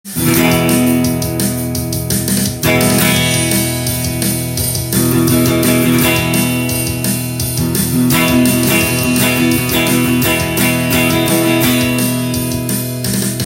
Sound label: e chord, guitar; emotional expression code